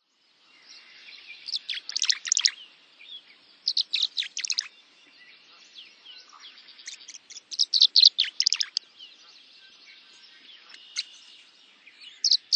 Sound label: Tree Swallow; Birds